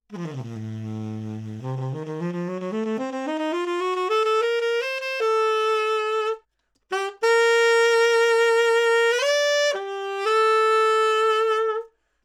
Sax Tenor 20cm High Mid

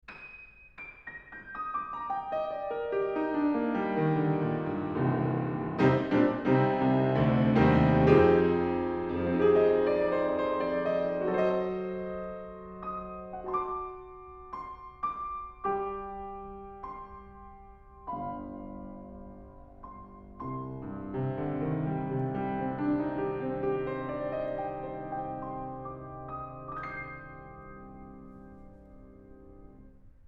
Piano, Horiz Half Open Mic

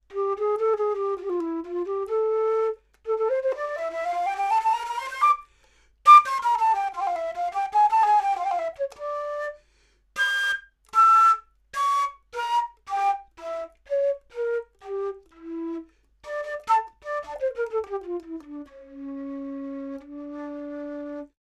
Flute; 40cm

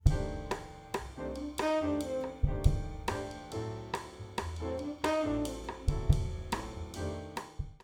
Rough Mix Drumkit

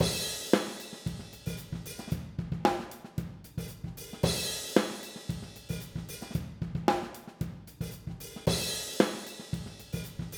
Drum Overheads Stereo Phase

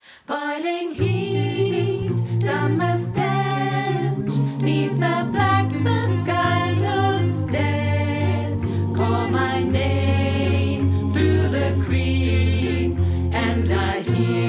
Remote Choir

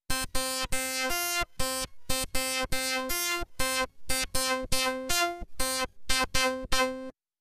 Synth Shift

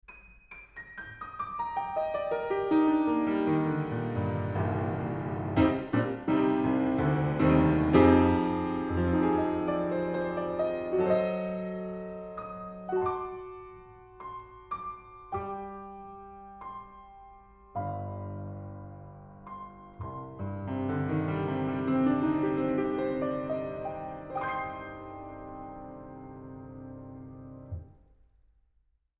Piano, Spaced Omnis 100cm

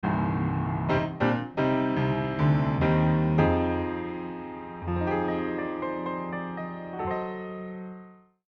Multimic Facets Piano2 Bass Mic

